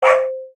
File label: ring; doggler vs barron von hare